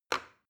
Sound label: plastic button start release regular